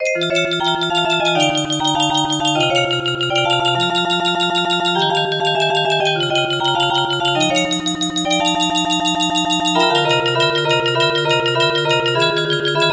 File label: xylophone, music box melody